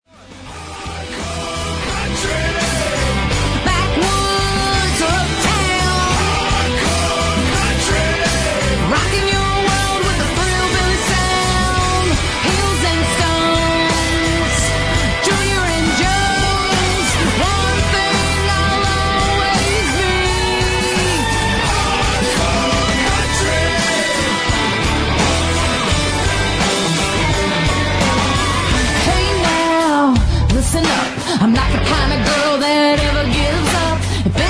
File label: hardcore country